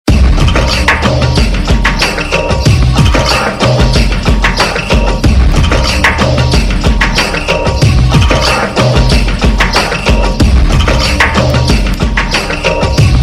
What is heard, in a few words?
Classical Tune; Parvatis; TV Serial Tone; Life OK; Devon Ke Dev Mahadev